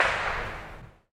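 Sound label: reverberating hit far away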